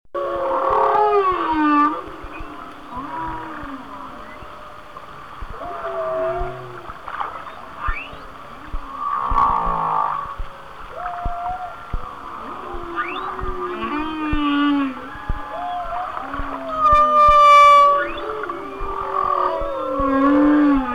Whale songs; Mike McDonald

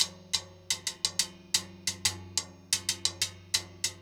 timb cow 120 bpm, latin; Sound Effect Library

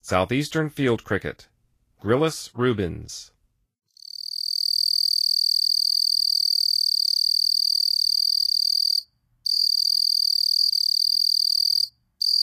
Southeastern Field Cricket

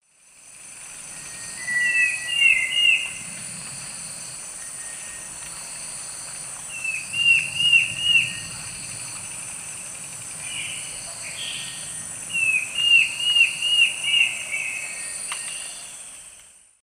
Schistocichla schistacea, song; S. America; Eastern Lowlands

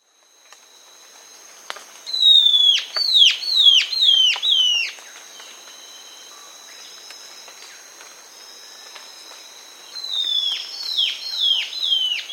Bare-Eyed Antbird, Nature's Songs, S. America

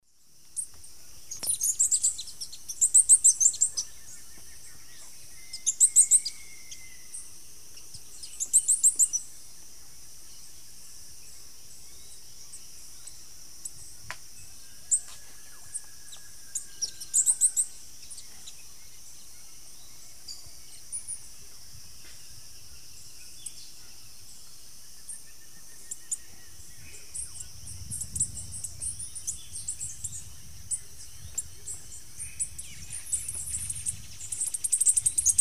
Tangara velia, song and calls; S. America; Eastern Lowlands

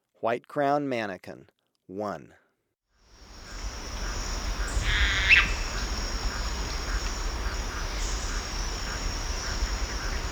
White-Crowned Manakin, Birds of Ecuador; S. America

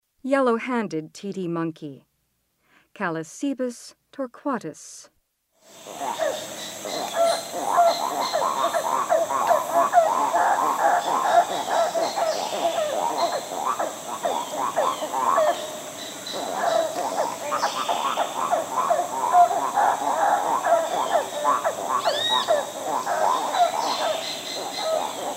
Yellow-handed titi monkey; S. America; Rainforest

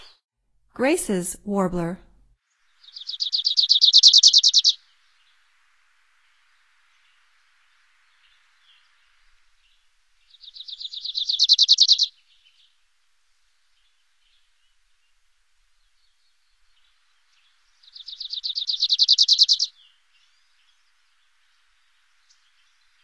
Graces Warbler, Songs of Birds of North America; Stokes

